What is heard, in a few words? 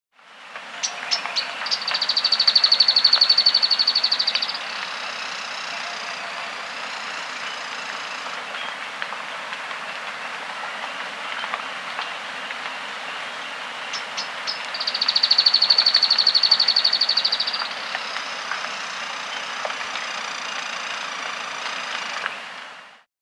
Streaked Tuftedcheek (Pseudocolaptes, S. America